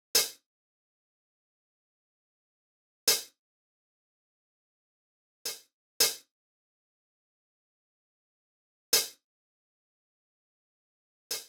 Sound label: Drum Loop, 164 BPM Open Hat, Boom Bap; Cymatics; GHOST (Beta Pack); Darling